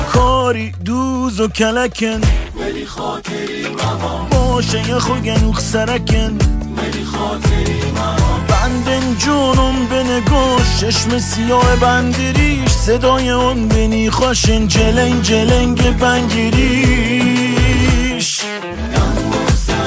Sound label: bandari; car bandari shad